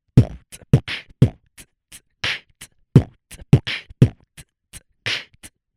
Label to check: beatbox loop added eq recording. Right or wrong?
Right